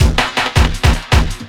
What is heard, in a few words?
Drum & Bass, 160 BPM